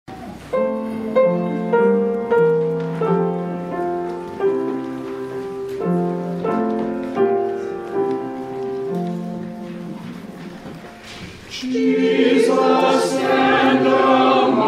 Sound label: hymn; Jesus, stand among us